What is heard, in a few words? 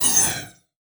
Multimedia Internet CD-Rom Flash Whoosh Short